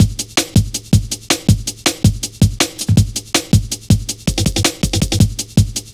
Drum Loops, Jungle; 161.5; Zero-G Jungle Warfare 1, 2 & 3; Skippy B; 90 s Sample CDs

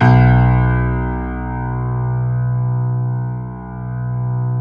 B0 YAMAHA R, GRAND; NS GRAND

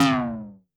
Tom Hi, Korg MS-20 Drums

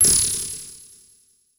CABL TEN, CABL TENS; Total Control - Action Cutz

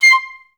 STAC C6, Advanced Orchestra; Best Service; Peter Siedlaczek's Advanced Orchestra

